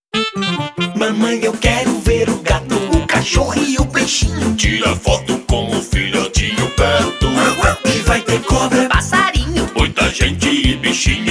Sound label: jingle